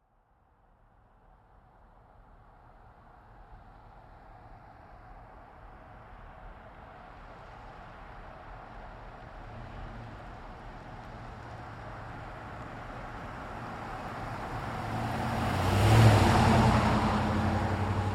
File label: Truck GMC Savana By Slow; Vehicles